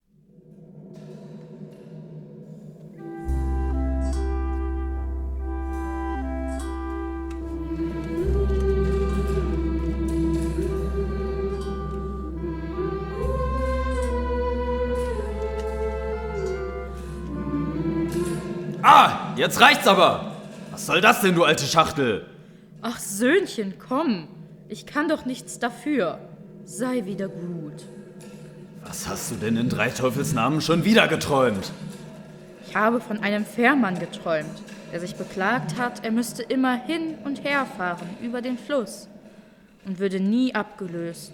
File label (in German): Schlaflied